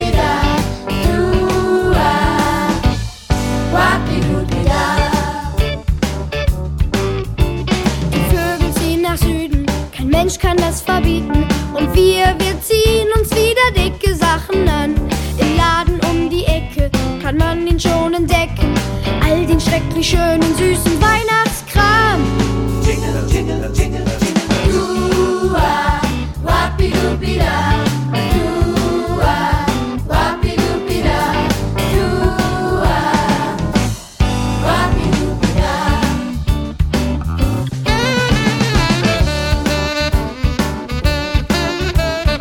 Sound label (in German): Regenwetterblues